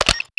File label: Menu Escape; Custom UI Sounds; Call of Duty Ghosts